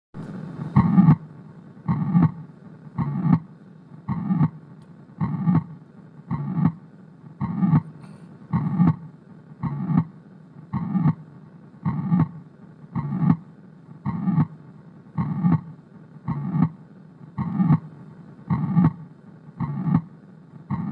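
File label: Ventricular septal defect; Ventrivular septal defect; simulatorvzorky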